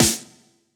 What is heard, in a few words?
Elec Snr, drum